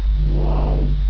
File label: warp